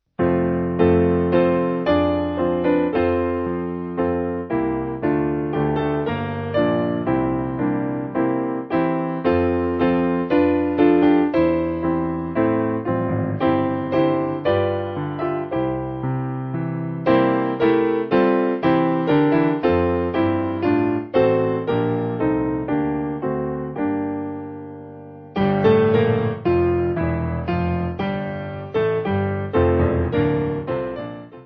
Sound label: Piano; O Jesus I Have Promised